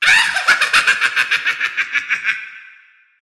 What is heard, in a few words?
evil witch laugh; soundscrate